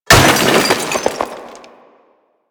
Barricade Destruction Small; Nineteeen Ninety Nine; Assassinate

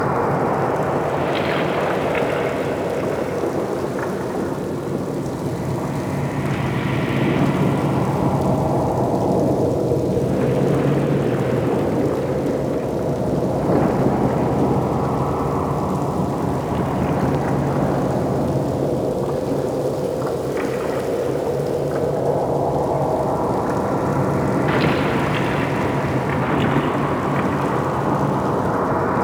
Ocean Cave Ambience; Warframe; Grineer Ocean; Interior

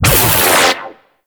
Radial Aura; Amalgam Carrus Pilot; Abilities

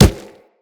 Kubrow Run Dirt; Footsteps

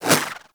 Throwing Star Whizzby; Warframe; Bullet Impacts; Whizzbys

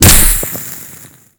Generic Ability Impact; Warframe; Ember

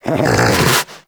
Snow Critter Vocal Alerted; Hunting Critters

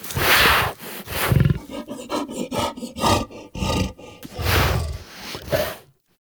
Juggernaut Truffle Fidget; Warframe; Infested; Truffle Hunt

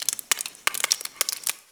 Ice Thaw Drip; Ambience; Warframe

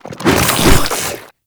Strike Flesh, Proto Infested Ancient; Warframe; Abilities

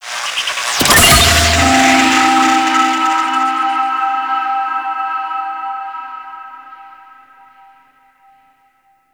Territories Point Capture Player; Warframe; Missions

